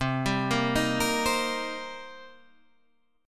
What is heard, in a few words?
C Minor 7th, Guitar, Half Step Down